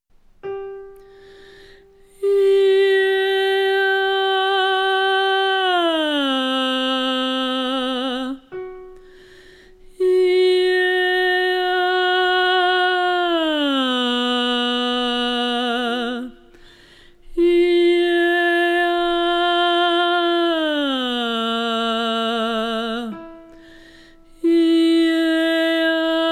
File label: Midrange Exercise